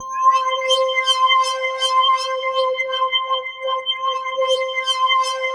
C5, SynthLine; 90 s Sample CDs; NiceBeats; SynthLine VOL-5

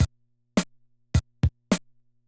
Loop, classic machines, rpm 40

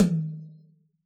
rock tom, Yamaha DJX Rock Kit

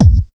bassdrum; Ueberschall; Jam Box

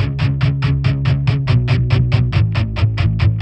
Distorted Guitar 140BPM C#; Distorted Guitars; Vengeance - Essential Clubsounds Vol.4; Guitarloops

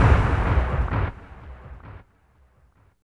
END, Tekno Industrial; East West

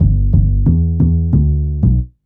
Upright Synth Bass, Drum & Bass; Fluid Dynamics

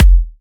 Electro Kicks

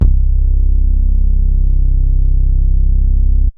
Synths BASS

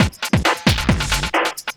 LOOP, Drum & Bass, 135 BPM

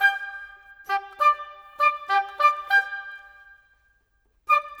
Flute Oboe, Orchestral; Sonic Foundry (Sony Creative Software); Orchestral VOL-2

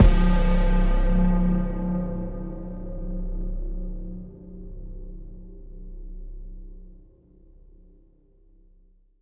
Dark Hitter C; M8; Electronisounds; Synth Prophet One Shots

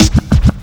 SD, dance drums hip

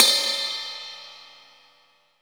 RIDE, MONO RIDE CYMBAL

